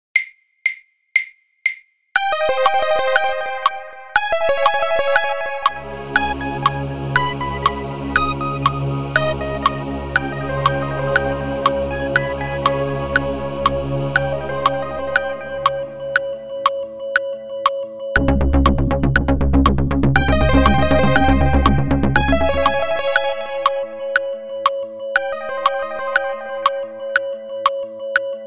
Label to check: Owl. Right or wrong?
Wrong